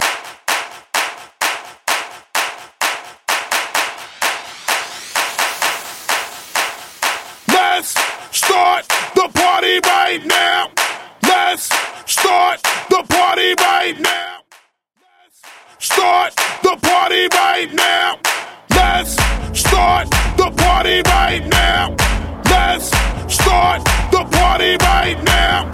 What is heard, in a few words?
90s Pop Rock Clap A Pella Bootleg 128 Bpm